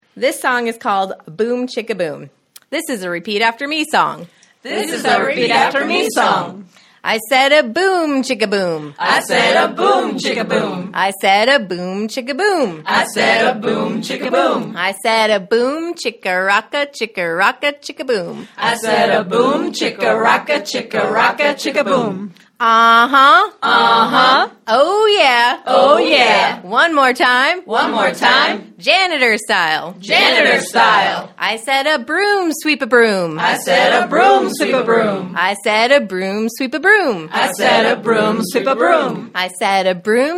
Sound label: Boom Chika Boom